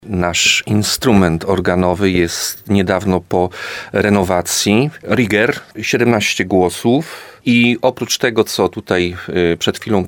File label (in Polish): organy; w nowym saczu